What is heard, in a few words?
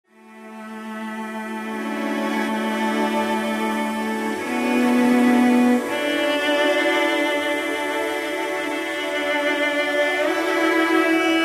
The Piano